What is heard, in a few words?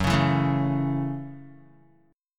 F sharp Minor 7th F sharp, Guitar; strummed; JGuitar